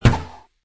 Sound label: bow shot; diablo 2